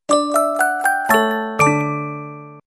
Standard message; Huawei U1250

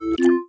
Wifi Call Drop; Nokia Lumia-1320; Lumia 1320 Southeast Asia

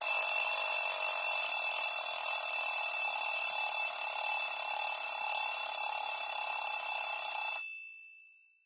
Alarm Clock; LG LS670 Optimus S; alarms

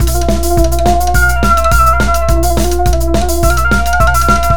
ringtone, electro; Google; NewWave Labs

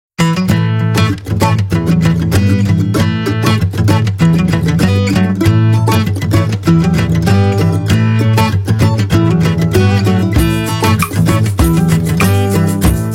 Nokia Woodsman

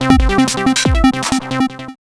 Techno Alarm; Sony; CLIE PEG TG50; SystemSound

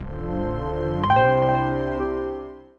Power Off, phonetones system

